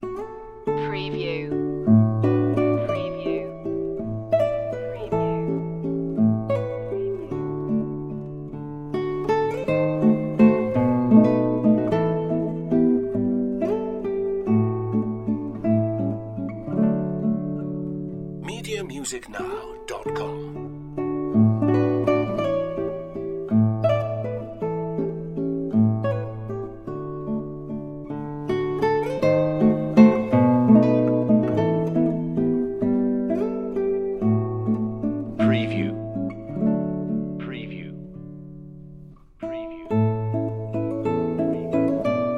waltz; classical music for media

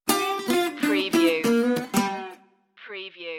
blues stinger

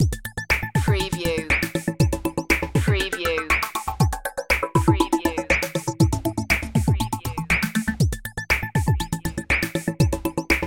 fabricator loop

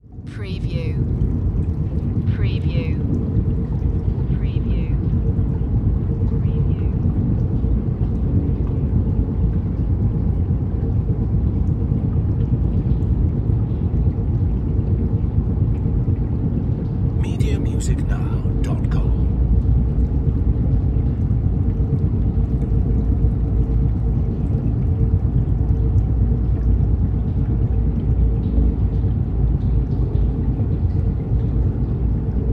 Spaceship Power

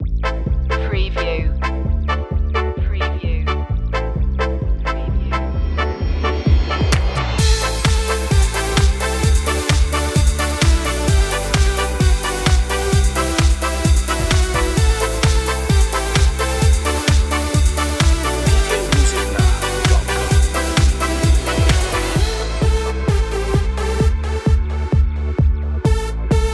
energy ska

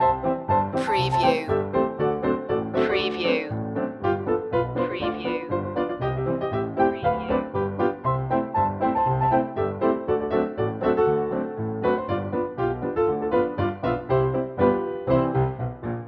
old saloon loop

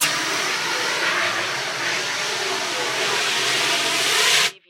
Fire Extinguisher

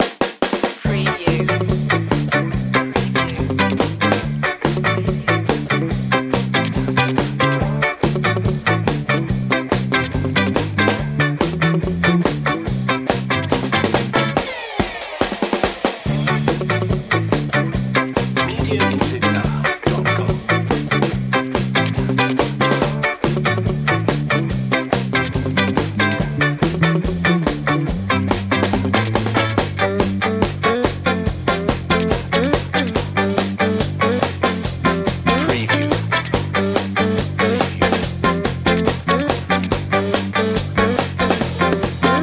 rudy rudy stomp no organ

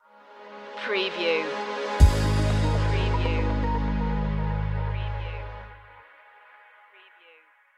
aerial messages stinger

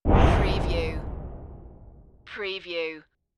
Wipe

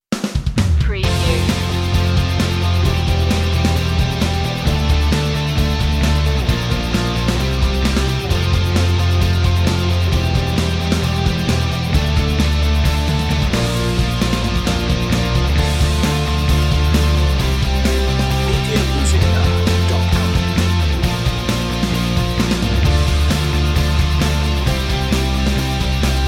no melody

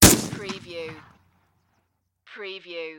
Shotgun Saiga 12; sound effects bible

